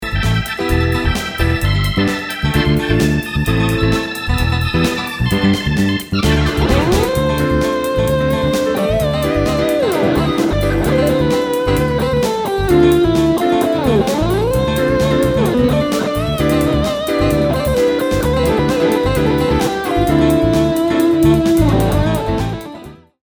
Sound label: Hip Hop